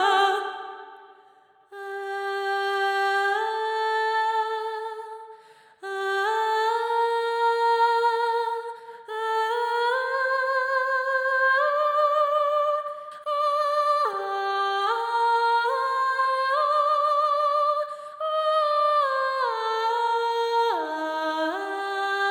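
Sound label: live vox, vox stem with intro vox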